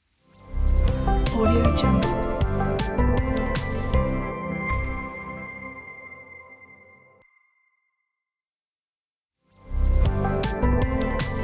podcast upbeat intro logo

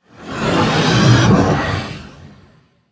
passby spaceship small; soundmorph

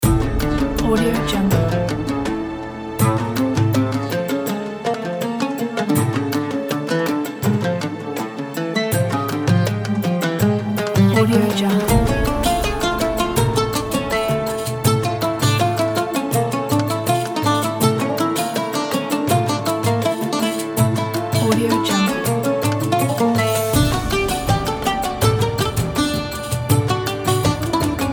ramadan oud

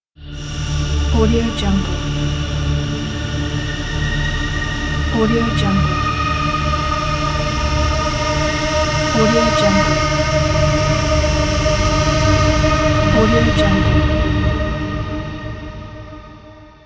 horror sounds

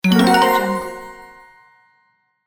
magic appearance

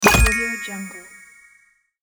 stamp of success

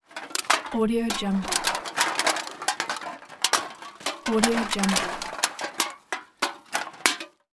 cans into bag

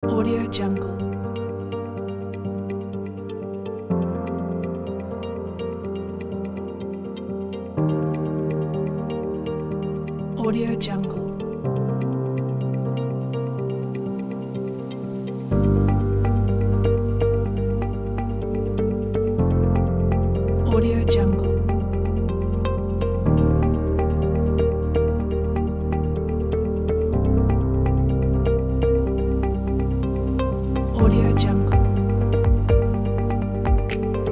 deep atmospheric documentary

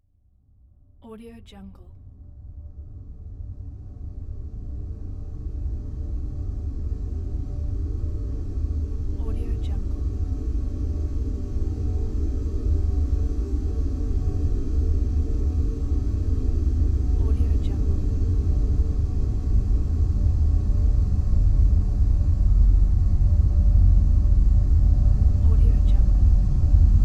drone ambience

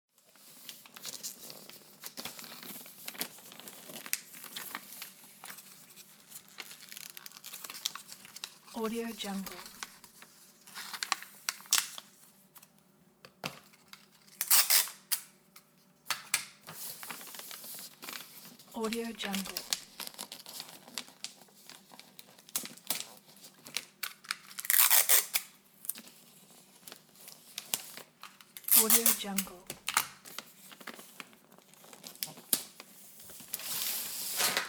wrapping gift

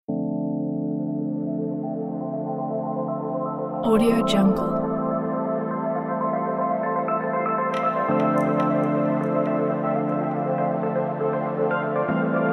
abstract calm electronic pack

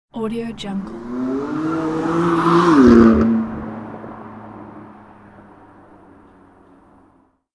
sport car fast pass by

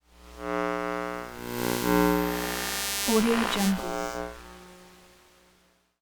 lost transmissions tonal passbys; soundmorph

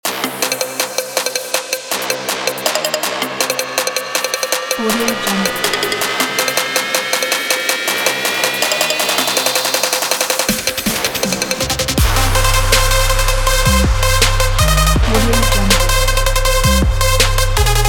trap

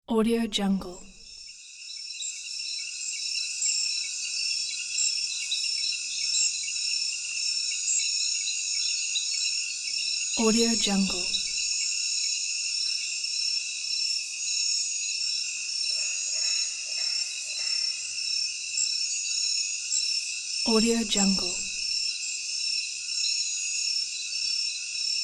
night jungle ambience

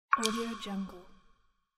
water drop

